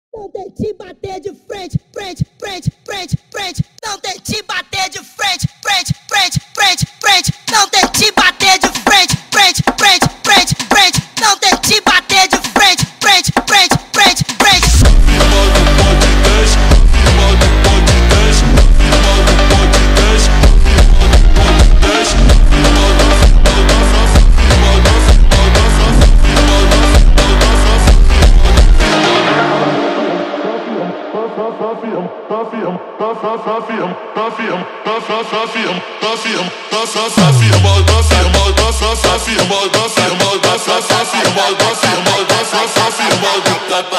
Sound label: brazilian phonk